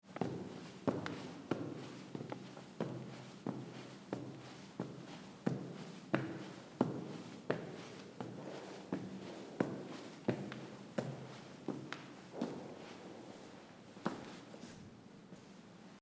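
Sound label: footsteps and cloths up the staircase; foley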